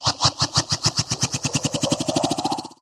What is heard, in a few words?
wheel protraction free games; Fruit Fiesta; Wazdan